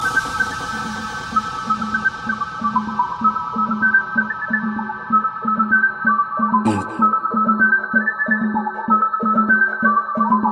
Crazy Rythem

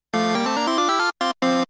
Elevator Sms

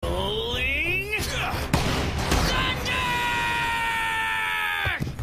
ROLLING THUNDER

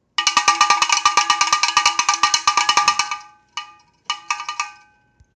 Cow Bell

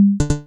Drum and plucks

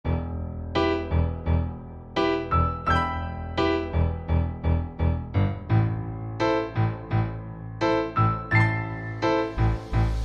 West Coast Style Beat

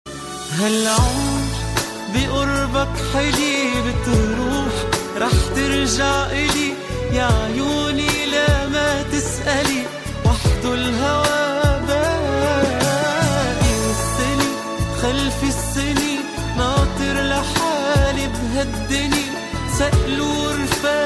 Arab Song

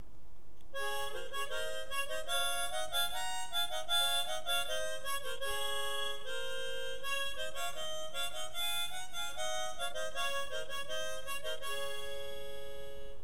Harmonica

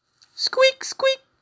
Squeaky boy

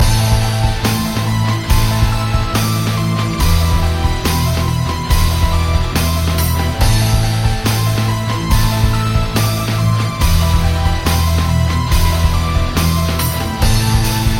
Epic 2 Short

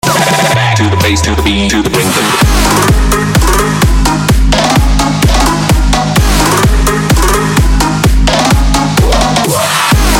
Trap ringtone

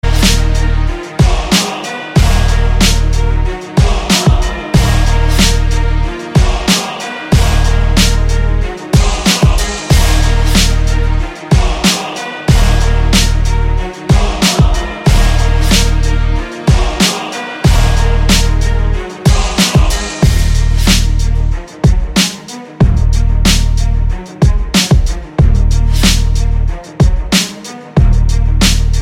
Best Beats

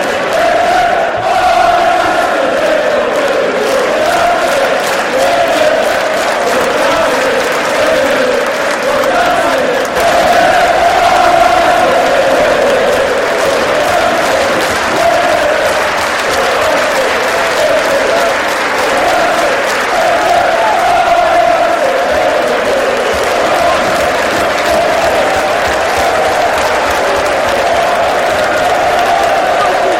Manchester Utd fans

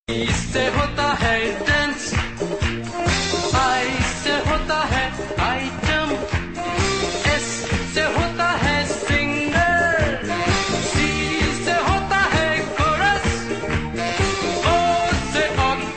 Disco Old

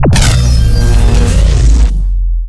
Emp Impact; Bf2142

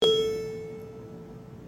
Otis Up Chime

